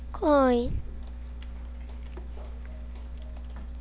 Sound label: coin